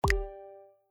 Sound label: success